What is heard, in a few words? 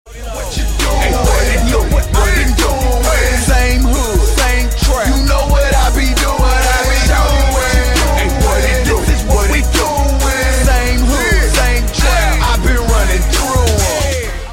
Chorus